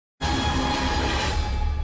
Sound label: suspense